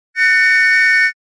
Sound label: whistletone